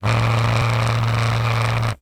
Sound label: Technology Electronic Cell Phone Vibrate On Cement